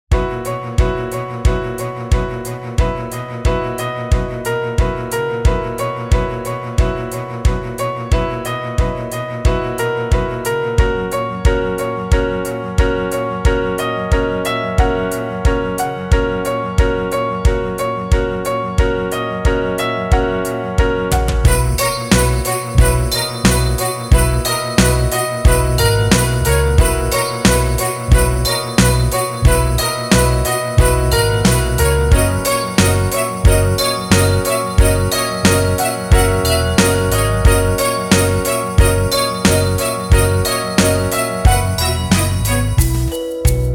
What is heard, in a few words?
A Cheerful Stroll